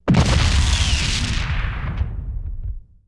Explosion Large Flare; Weapons & Explosions; Sony Pictures Sound Effects Series Volumes 1-10; Sony Creative Software

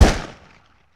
Pistol Shot With Echo; Weapons & Firearms; Sony Pictures Sound Effects Series Volumes 1-10; Sony Creative Software

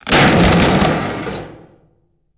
Wood Impact; Impacts & Destruction Sweeteners; Sony Pictures Sound Effects Series Volumes 1-10; Sony Creative Software

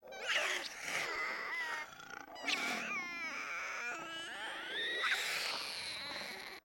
Ganglion Eater, Vocals Humanoid; Sony Pictures Sound Effects Series Volumes 1-10; Sony Creative Software

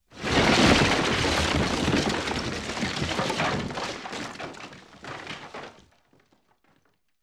Rocks Rubble Sweetener; Weapons & Explosions; Sony Pictures Sound Effects Series Volumes 1-10; Sony Creative Software

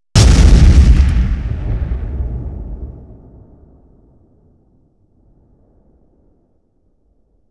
Explosion, 5.1 Surround; Sony Pictures Sound Effects Series Volumes 1-10; Sony Creative Software

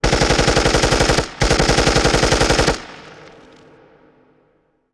Machine Gun Burst; Weapons & Firearms; Sony Pictures Sound Effects Series Volumes 1-10; Sony Creative Software

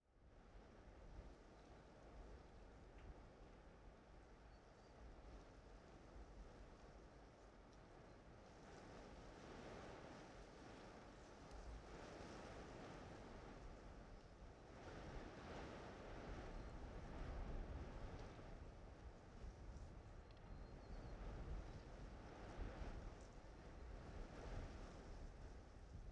Gusty Wind; Sony Pictures Sound Effects Series Volumes 1-10; Sony Creative Software

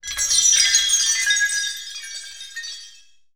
Effected Glass Shatter; Hits & Tones; Sony Pictures Sound Effects Series Volumes 1-10; Sony Creative Software

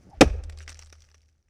Tomahawk Throw & Impact; Weapons & Firearms; Sony Pictures Sound Effects Series Volumes 1-10; Sony Creative Software

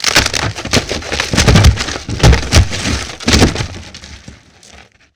Wood Destruction; Impacts & Destruction Sweeteners; Sony Pictures Sound Effects Series Volumes 1-10; Sony Creative Software

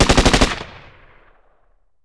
Machine Gun Bursts; Weapons & Explosions; Sony Pictures Sound Effects Series Volumes 1-10; Sony Creative Software

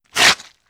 Sword Cut Through Flesh; Weapons & Explosions; Sony Pictures Sound Effects Series Volumes 1-10; Sony Creative Software

